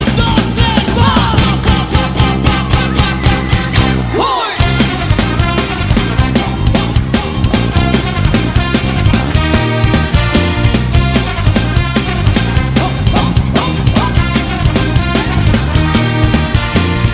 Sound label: ska; Estampida